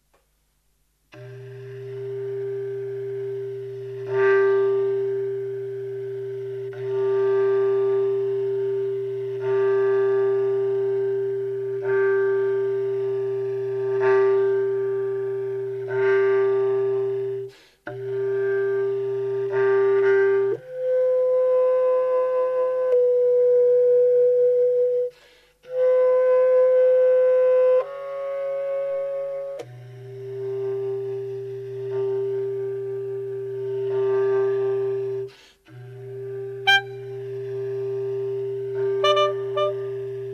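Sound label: duo bass clarinet soprano saxophone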